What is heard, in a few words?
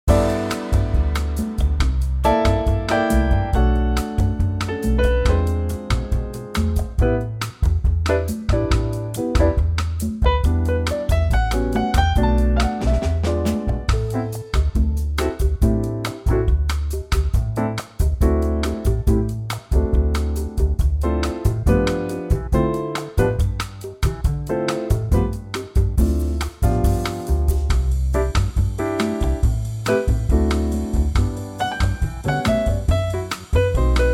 G Trio Latin; key - G - vocal range - G to A; Cool Trio plus percussion arrangement